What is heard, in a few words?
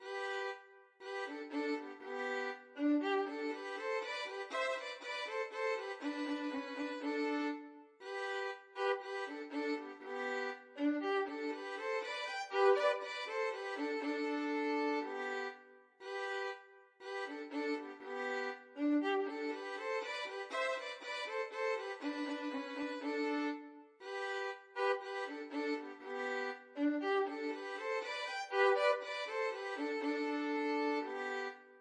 mozart violin duet